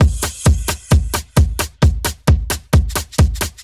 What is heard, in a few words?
Beat Mod 132bpm, uk garage